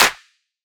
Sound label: Grime Clap, Dubstep; Claps; Drums; One Shots